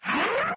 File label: travel maximize; windows 9x; sound schemes